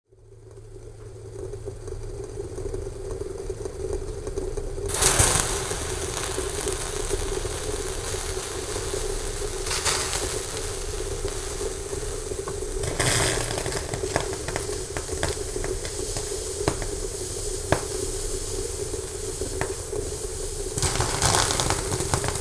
Water boils in a pot, runs out onto the stove

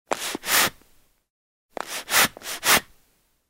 Fanning the fire with bellows

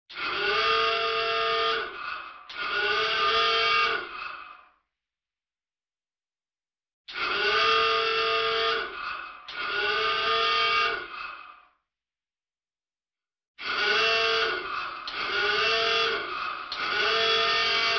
Signal, alarm on a submarine

